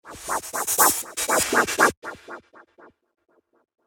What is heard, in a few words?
Supernatural Sweep Sound, whoosh sound effect; fantasy sound effect